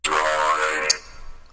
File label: droid alert